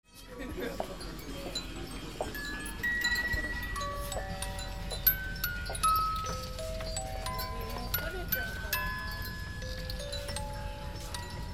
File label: AMBIENCE